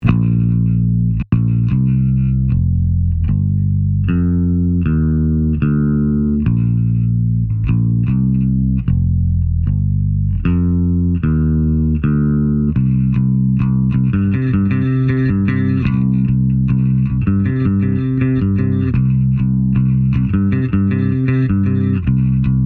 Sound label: bass